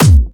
normal hitfinish; Sweet Rain v1.2 『Cylentic』

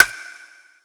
soft hit whistle; Azer+ 2023

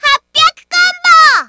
combo burst; Taiko AC0 v4

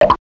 combobreak; froooon v18.8

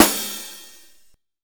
soft hit clap; Azer+ 2023

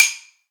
normal hit whistle; Nino

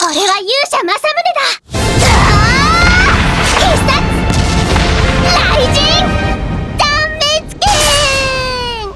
shutter; Moe[Sagi]ri; Moeriko

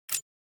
slider bar; summer pockets (pink)

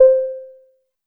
match start

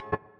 check off; how the sky was

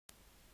slider slide; Denying's Scathach

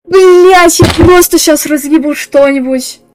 applause; Rafis (Akani edit)

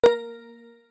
click short confirm; Seele 【selyu】

